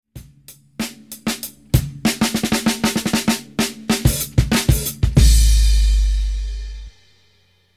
Break

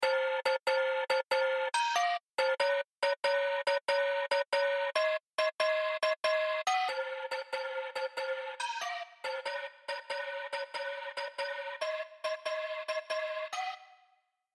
Spect Bell

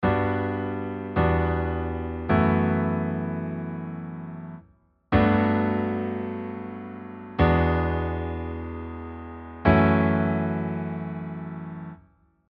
harmonic; The chords you hear are: G maj7 – Em9 – F#m7 add11 G maj7 – E9 – F#m7 add11

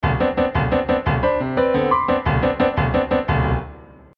percussive close and open position

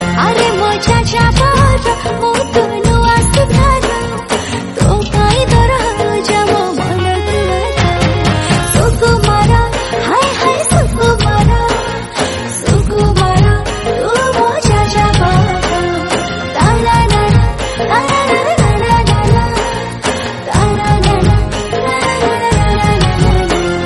Odia

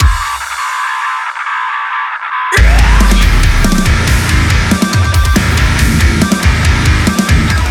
subdrop

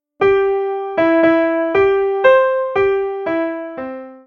Hymns sublime piano; Notice that both syllables of sublime should be sung on the same note

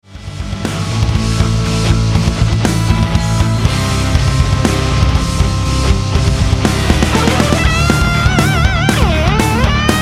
tempo contrast

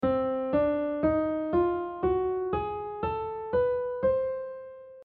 whole-half diminished

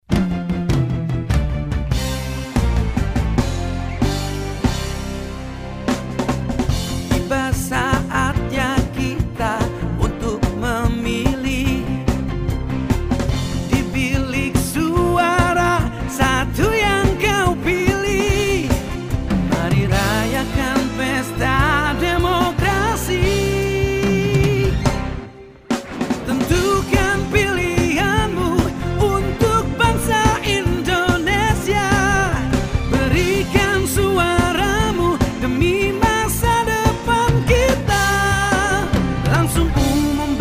Jingle

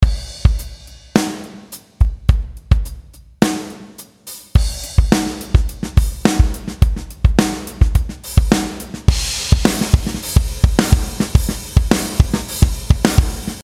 rhythm cell

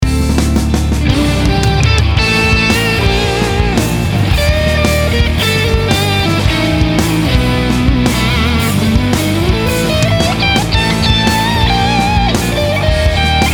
solo

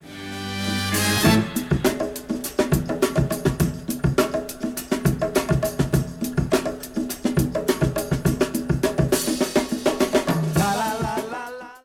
beat

